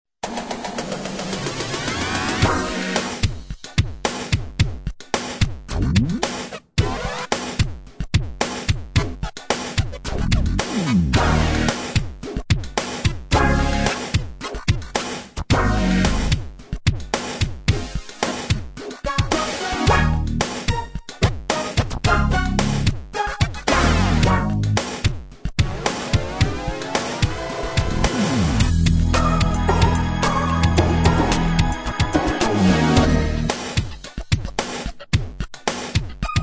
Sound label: k2600 introduction